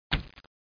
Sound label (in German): faellen